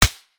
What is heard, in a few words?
a91 shoot, weapon